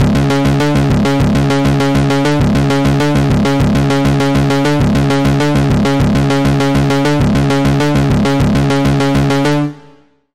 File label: Pluck, Sylenth1